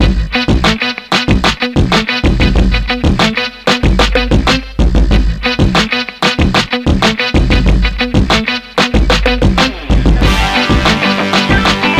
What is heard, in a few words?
Game Intro - Fanfare; NBA Live 2005; GC